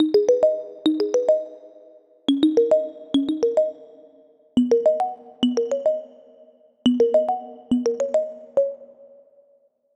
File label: Melody Pluck Reggaeton; midi wizard 2; Unison